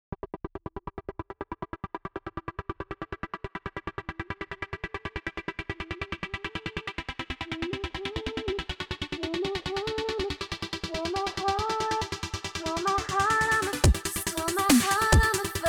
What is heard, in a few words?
Heartbeat Before Mastering